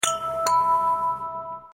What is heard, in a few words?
Message incoming; Samsung SGH-T939 Behold II